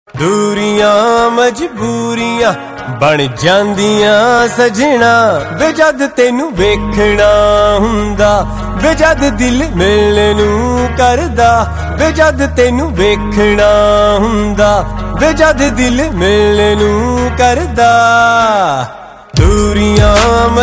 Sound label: Punjabi New Sad Tones